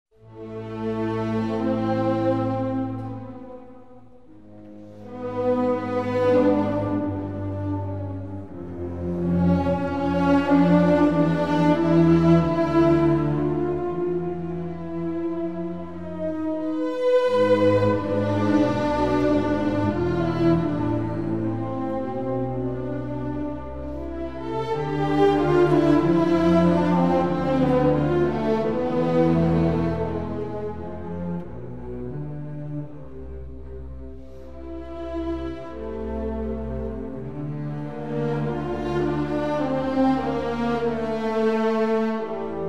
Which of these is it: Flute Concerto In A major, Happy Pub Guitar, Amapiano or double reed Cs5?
Flute Concerto In A major